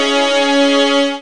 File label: SYN Glider Sy; Best Service Gigapack 1